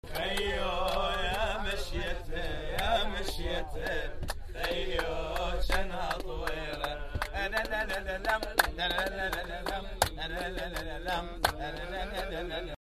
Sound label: loop